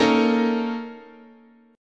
A sharp Minor Major 7th Double Flat 5th, guitar chord